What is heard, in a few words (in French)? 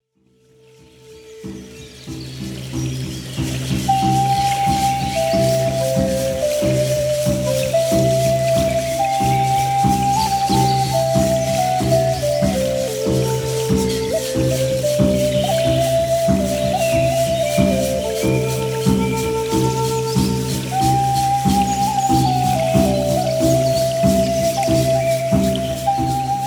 tambour hochet flute; roue medecine